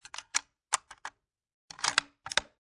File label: Rifle Bolt Action Insert Round And Rack; Gun Handling; Lynne Music